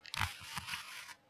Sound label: shine; RubiCon